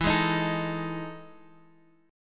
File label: E Major 11th down Guitar Half Step Down; EM11